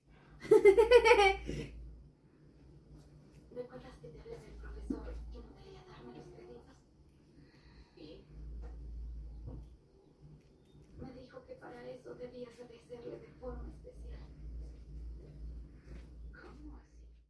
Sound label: laugh ringtone; android